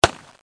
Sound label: colt hand, guns; KABOOM! - Wild and Wacky Sound Software